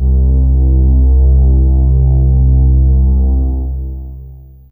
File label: Low Str C2, LoString; Fairlight CMI IIe